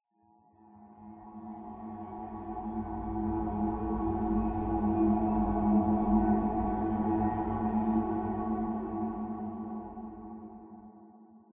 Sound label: Granular Voice